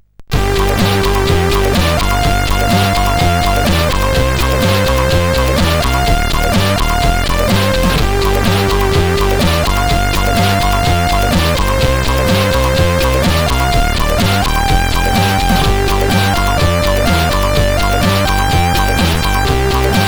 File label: Bomb Fusion, sid CSG8580R5; Japmaster